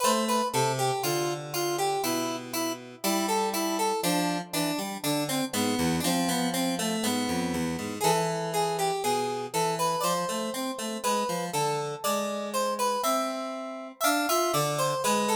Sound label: Harpsichord; Liquidator 2 Welcome to Hell